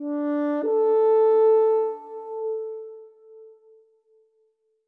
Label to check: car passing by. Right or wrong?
Wrong